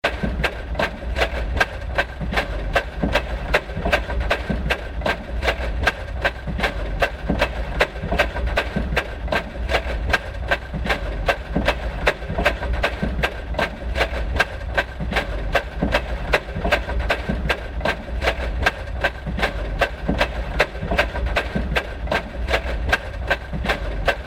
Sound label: washing machines